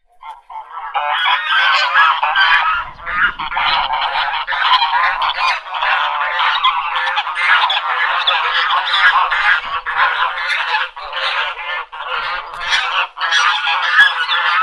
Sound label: duck noises ringtone